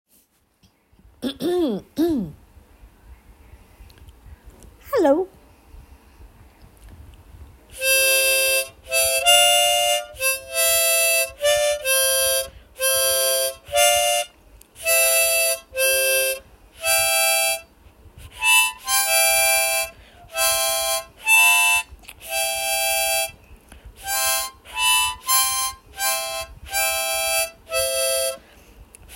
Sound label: Harmonica